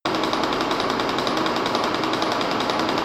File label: ecoCORK without underlay; Insulation Acoustic Underlay; Pentarch Forestry